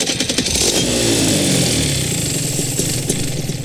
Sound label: Rotax start in